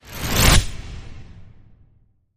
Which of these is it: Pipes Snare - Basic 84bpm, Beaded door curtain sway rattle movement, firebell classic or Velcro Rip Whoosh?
Velcro Rip Whoosh